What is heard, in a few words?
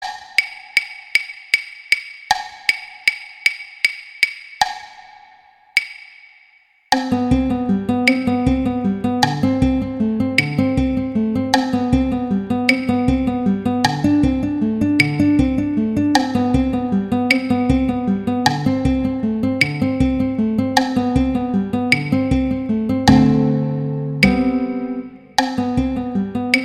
Larghetto Violin; Paganini; Sonatas Op1 Vl Gu; Sonata No 2